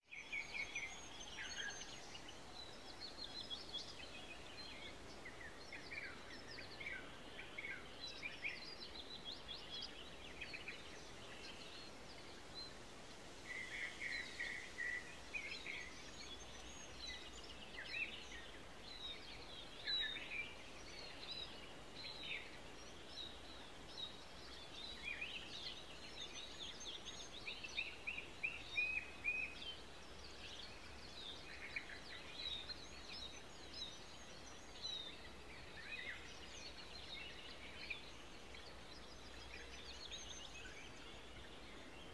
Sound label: Serene Mountain Ambience